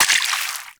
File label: Water Sprayer Surface Impact; Warframe; Weapons